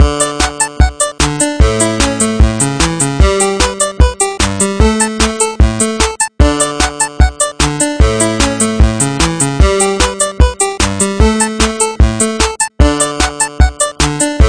8bit 150

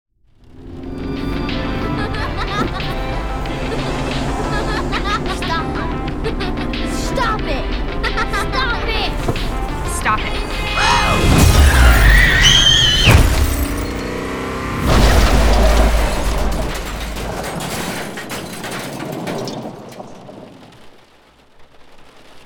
Glitch Crash

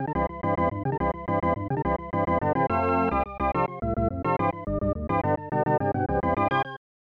Fanfare ~ Drama; super bases loaded 3; snes

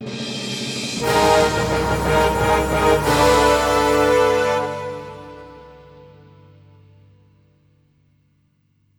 Se Btl Victory Lively; Dragon Saikyou-Ou Zukan Battle Colosseum

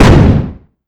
Flash Bang; Welcome to the Game II

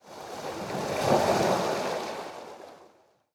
waves; CS Level2

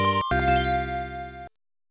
Music - Jingle

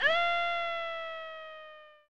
Fall (Female); Steven Seagal Is the Final Option; prototype; snes

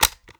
Button

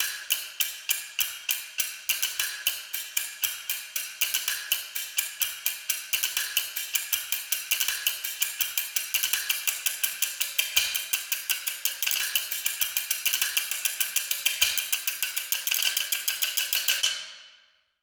Tower Time Tapping; G1 Expansion; Garshasp Temple of the Dragon

